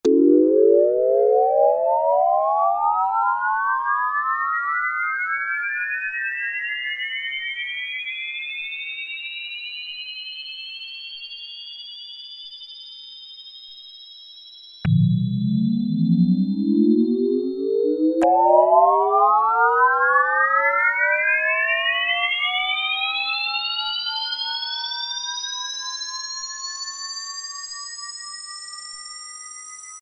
Space Sound, Roland Juno-60; Factory presets

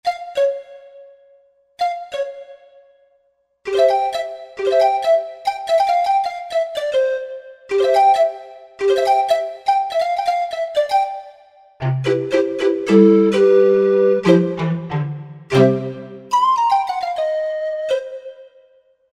CuCu Flute

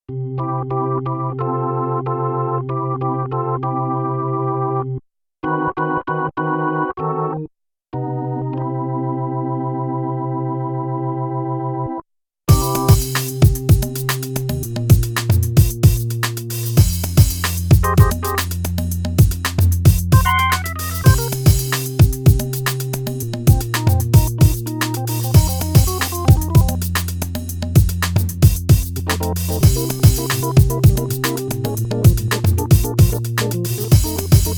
Rotary Organ, Korg Radias-R

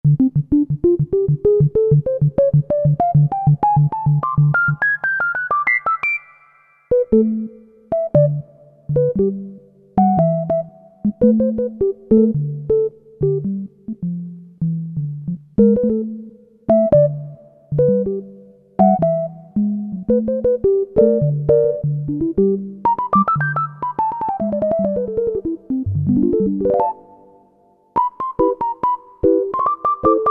Perc, Korg MSC-9S Organ

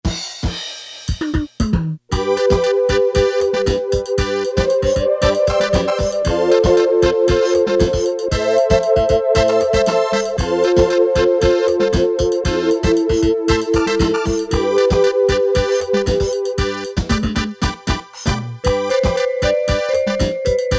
16 Beat, Casio CT-370, Rhythms

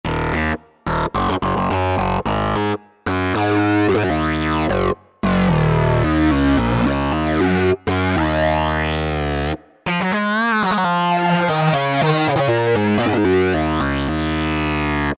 Fuzz Box, Alesis QS8; Factory Preset examples

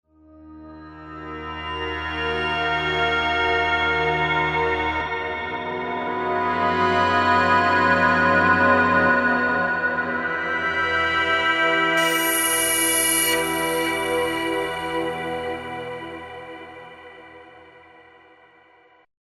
Feedback Pad, Roland V-Synth; Factory Patches

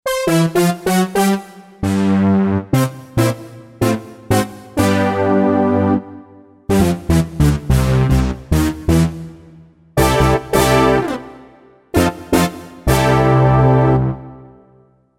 OCT BRASS, Roland SC-880; Factory Patches

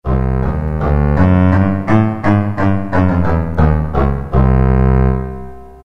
Contrabass, Roland MT-32

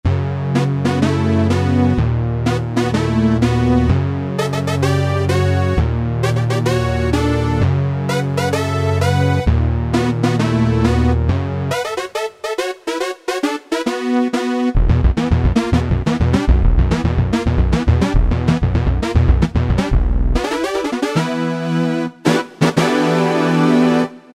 Brassy 5th, Alesis QS8; Factory Preset examples

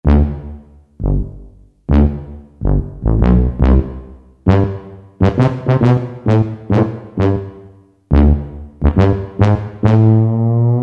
Tuba, Roland PN-D50-01

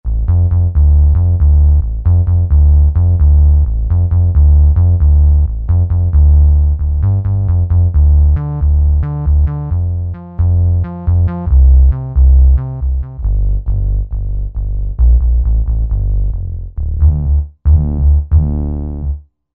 Low Bass, Roland SR-JV80-12 Hip Hop Collection

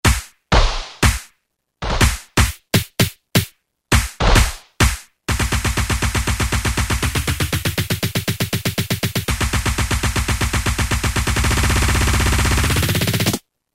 Selecta Drum; Roland M-DC1

